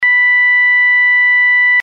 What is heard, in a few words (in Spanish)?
sonido electronico; 24v cc